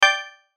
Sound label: chime high; Chimes (Sound Effects:Multimedia)